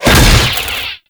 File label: Staff Impact C, weapons